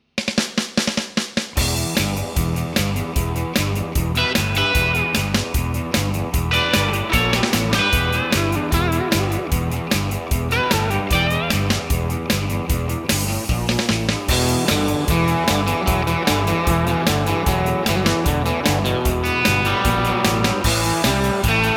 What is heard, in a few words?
Gibson